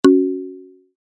Info pop notification help app

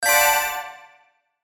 Puzzle strategy game menu ui confirm

